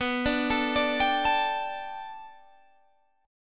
B Minor 7th Sharp 5th B Guitar Open G, chord

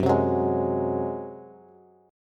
Gb Suspended 2nd Flat 5th Bass; Gbsus2b5 strummed; 14,15,16,13

